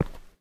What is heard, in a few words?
ROCK, steps; Terrarum